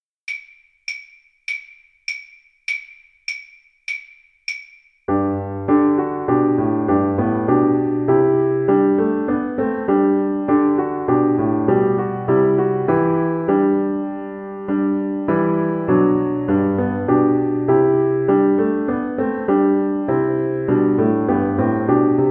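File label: vc karaoke, Cello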